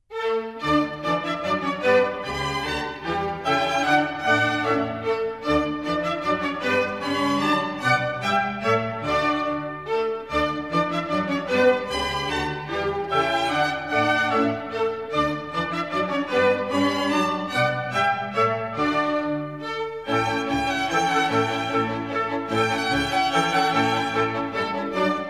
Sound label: Minuets, D major; Mozart; Dances and Marches